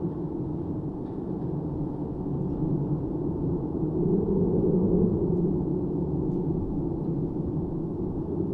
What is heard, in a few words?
weather wind loop